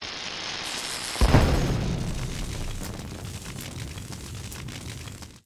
Electric Burst Into Flames

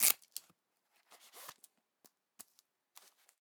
Gun Out Of Holster Pouch Velcro; Nova Sound

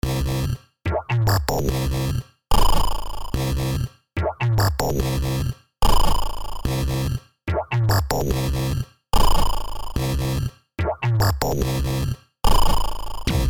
8bit

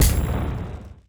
Cuckoo Clock Cocktail Layer; Nova Sound

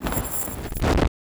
Chain Bomb Layer; Nova Sound

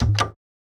Enter Stroke

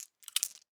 9mm Rounds Bullets Gun Metal; Nova Sound

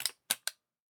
AR Click Gun Foley; Nova Sound

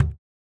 Delete Key

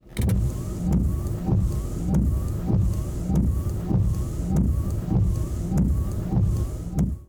Car Windshield Wipers Speed 1 Close Up; Nova Sound

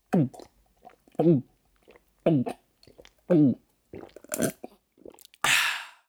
Drinking Something Gulping 5 Sips Foley; Nova Sound

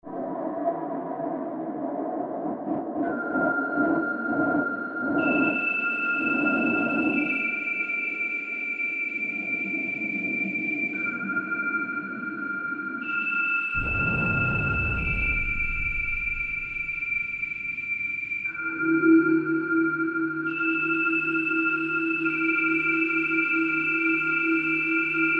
scary

